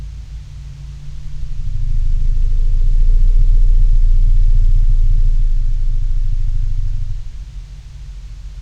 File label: elephant loud; calls; Elephant Vocal Repertoire